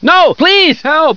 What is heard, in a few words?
whelp